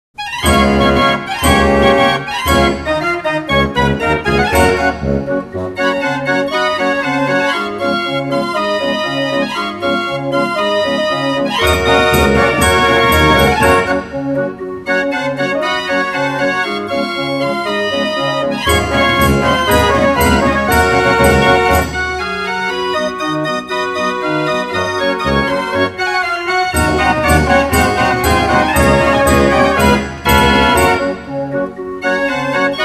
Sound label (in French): polka, boite a musique; diamants